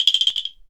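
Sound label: clacker toy; ILIO; Synclavier Percussion 2; World & Orchestral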